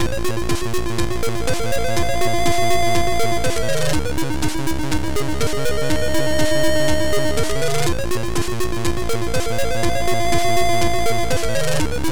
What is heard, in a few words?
Loop call loop